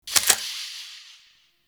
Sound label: Heavy Pistol Reload B; Warframe; Weapons; Zariman Heavy Pistol